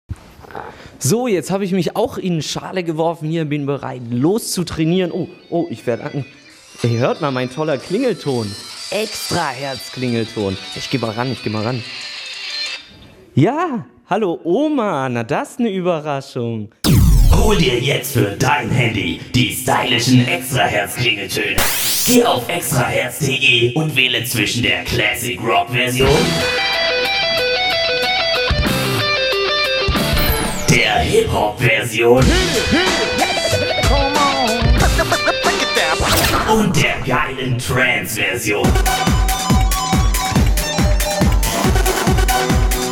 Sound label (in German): Klingelton; extrahertz